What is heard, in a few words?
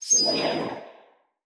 pickup lightning; Learn to Code From Zero With Godot